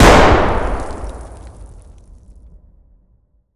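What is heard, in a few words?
grenade explosion indoor distant; Escape from Tarkov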